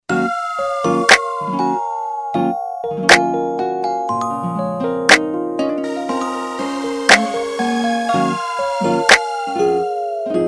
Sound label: Blue Chime